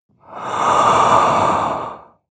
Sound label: inhale male